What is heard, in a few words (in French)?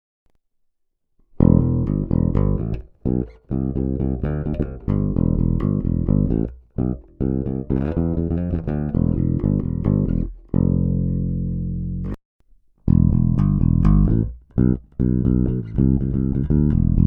basse